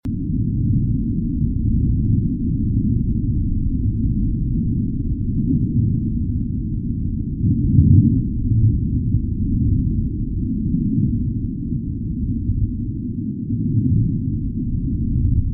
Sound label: Deep sea underwater noise loop; UltimateFishing2020